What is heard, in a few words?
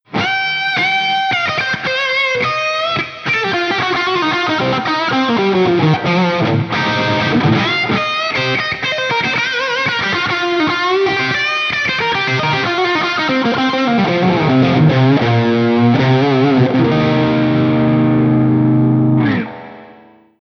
CROWN plus OCEAN